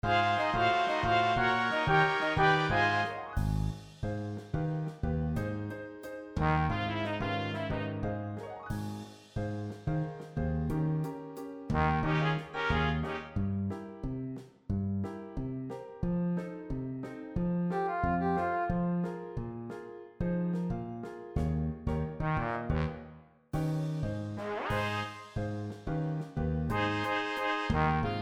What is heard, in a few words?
kids band MIDI